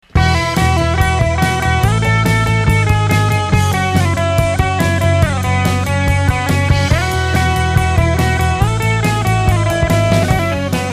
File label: drum